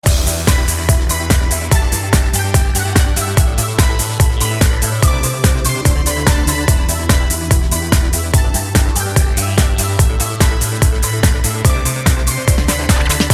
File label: Fast Instru